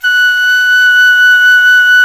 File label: DIZI F#4, DIZU FLUTE; INIS; Opium